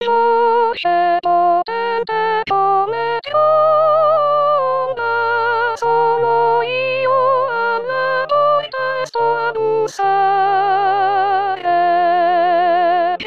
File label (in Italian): Soprani